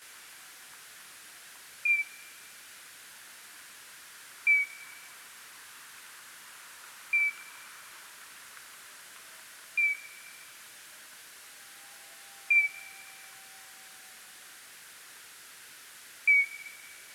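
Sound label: Nyctalus leisleri, bat; Boehm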